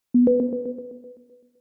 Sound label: sonar